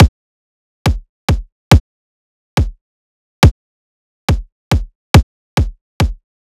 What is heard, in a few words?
Riddim Drum Loop 140 Kick; Ghosthack; Riddim Essentials Volume 2; Full Drum Loops